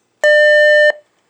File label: Page Alert Tone